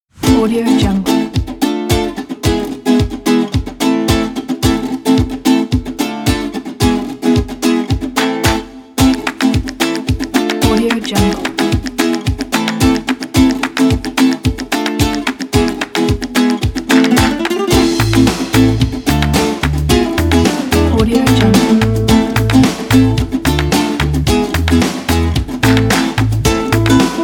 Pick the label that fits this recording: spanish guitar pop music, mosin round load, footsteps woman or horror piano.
spanish guitar pop music